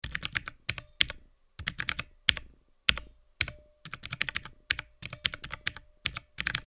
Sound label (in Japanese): タイピング メカニカル 長